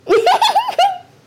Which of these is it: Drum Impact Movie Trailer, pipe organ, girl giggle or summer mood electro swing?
girl giggle